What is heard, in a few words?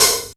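closing, hats cymbals; Zero-G; Funky Elements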